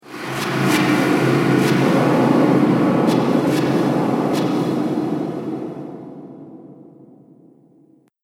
Impact Soul Destruction, impact / explosion sound effect; Sci Fi Sound Effects; Andrew Fleming